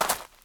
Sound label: gravel, step